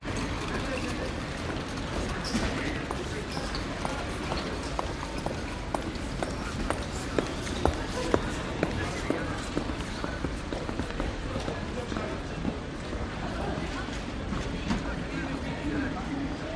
stockholm transport airport interior main hall heavy crowd footsteps pa; arlanda